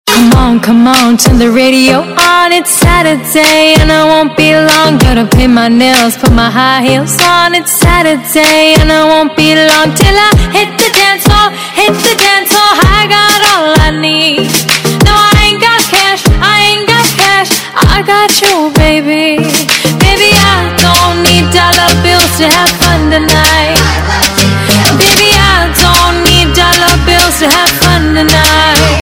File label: English Ringtone